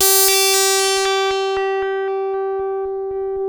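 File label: sample and hold G1; Best Service Dream Experience; S+HOLD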